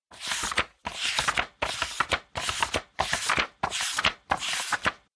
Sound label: Book Flip